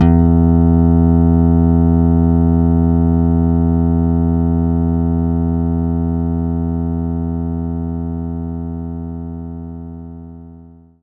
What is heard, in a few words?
Slap Bass E3; knights try